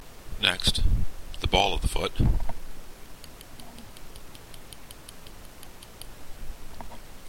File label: tap it